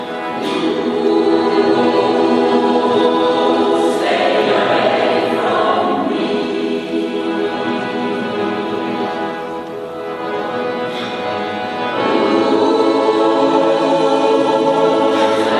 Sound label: Blues